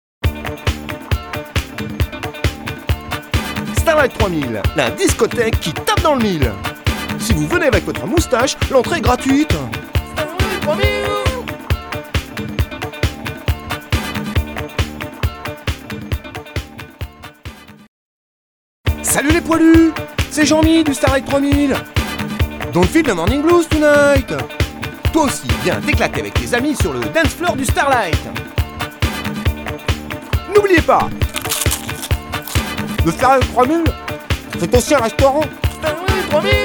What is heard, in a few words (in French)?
jingle pub; kerozen gazoline